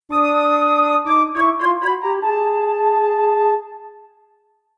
flute

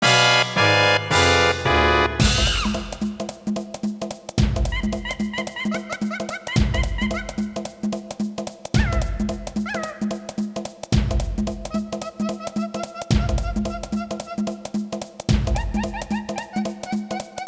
Race Lose; Donkey Kong 64

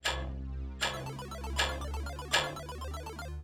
dance windmill; buildbot; Space Otter Charlie

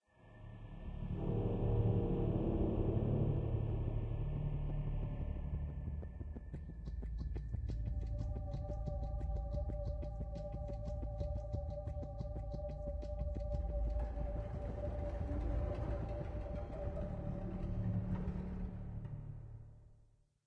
player turn spooky; devils demons android game music

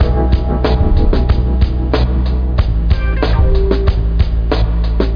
menu music; Alien Abduction

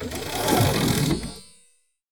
Conveyor Belt Move; candy crush saga gamerip

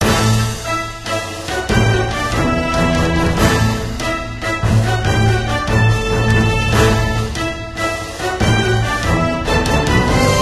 Dungeon Battle

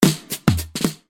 Mission completed Music

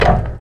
Gun Failure

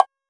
Bongo Tone

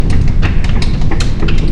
Crane

